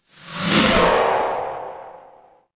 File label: magic scifi teleport